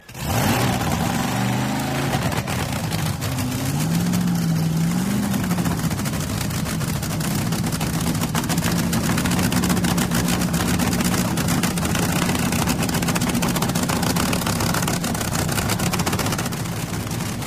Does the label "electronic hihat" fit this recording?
No